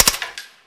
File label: silencer burst; Weapons